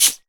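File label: FX shaker; AKAI S6000 CD-ROM - Volume 5; PERCUSSION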